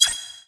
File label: check off; Sunset Horizons; seysant